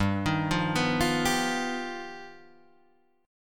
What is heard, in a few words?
G Augmented 7th; G+7 arpeggiated; 3,6,3,4,6,3; Standard; JGuitar